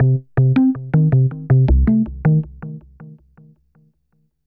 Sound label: SNTH BASS AMBNT 160 C; Ambient